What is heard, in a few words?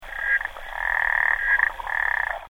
Xenopus laevis; voice